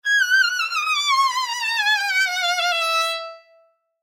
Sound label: Violin Trill, Violin Trill Descend Wither; Cartoon; Musical